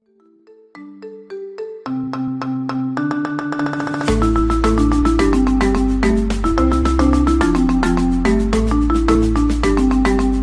marimba

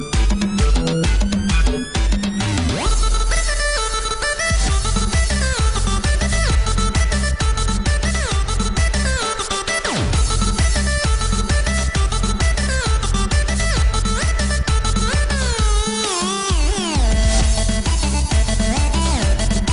tribal mix, ringtones latino

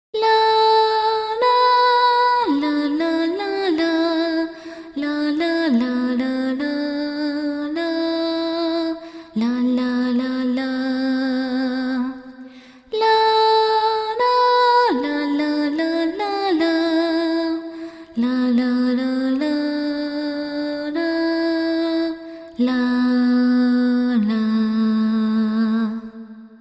Pray Cappella

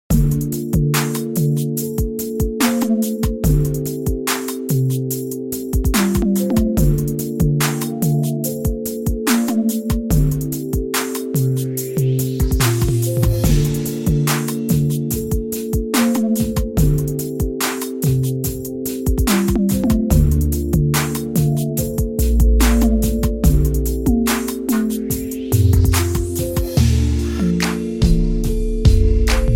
melody

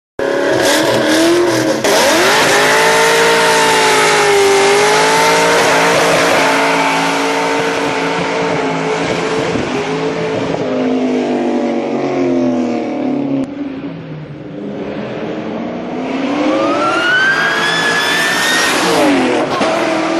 Cobra Fly By

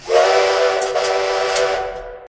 Whistl; Polar Express

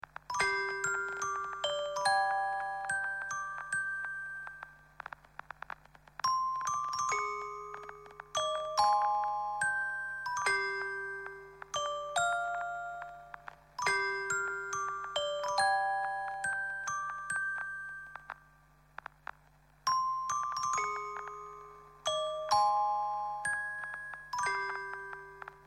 sad music box

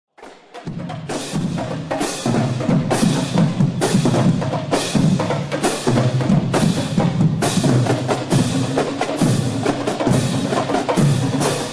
drumline live

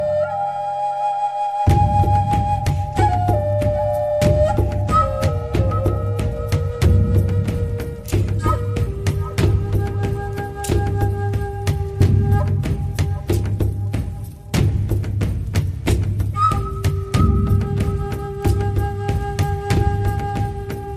Native Drums Flutes

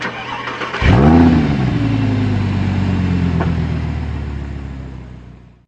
audi rs4

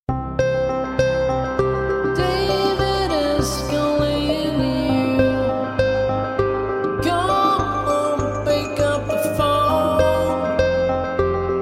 Is Calling Rock

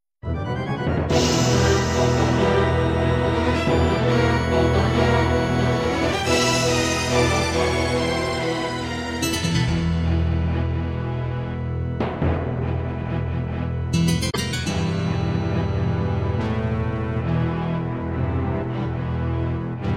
Acoustic

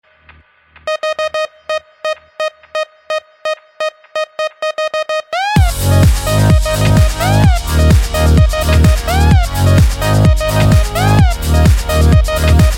electro house, ringtones electronic

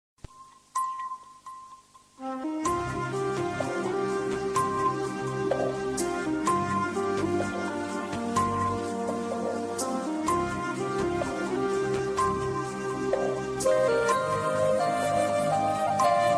melodious ringtone; ringtones

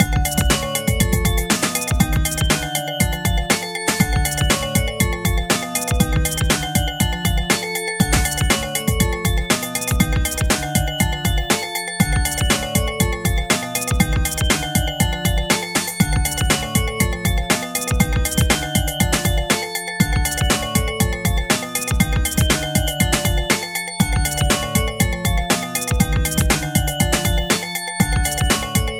Beat Ring

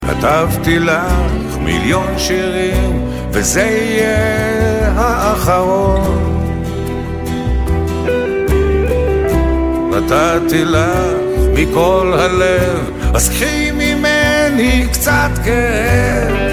nice song

